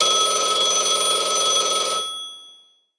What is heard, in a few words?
Phone; blue archive